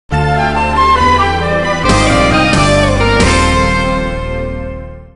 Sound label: Remembrance Flute; VlogIt